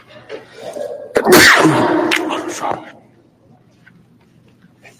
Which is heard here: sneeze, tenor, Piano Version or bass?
sneeze